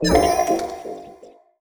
notification resolution vetoed; Stellaris; federations

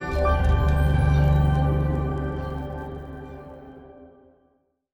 theme treasure; Stellaris; grand archive

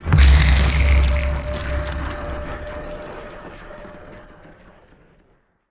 lithoid spider; lithoid portrait; Stellaris

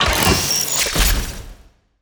ship designer add weapon; Stellaris

